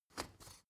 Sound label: step ladder wood scrape single